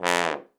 Trombone Fall

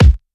Kick

